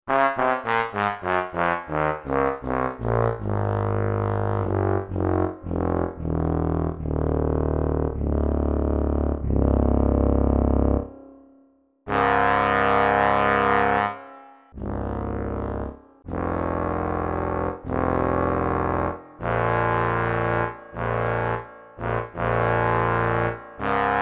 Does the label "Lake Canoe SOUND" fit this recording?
No